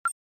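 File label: Flash Beep Electronic Tap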